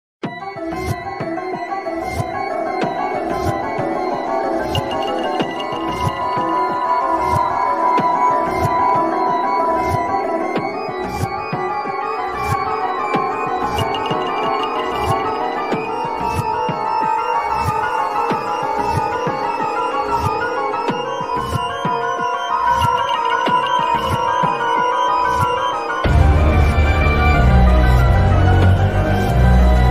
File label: Ghost House; SMM; SMW